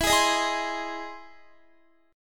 E Augmented Major 7th E down Guitar 4F 4A 5C 5G 5C 6E; strummed